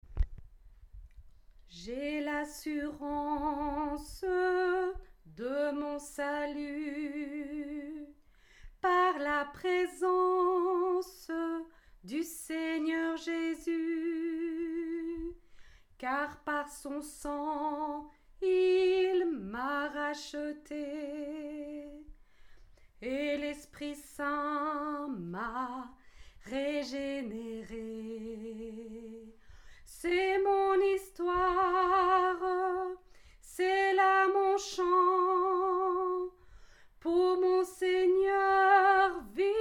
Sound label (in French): ALTO, chant a plusieurs voix; atelier de chant a plusieurs voix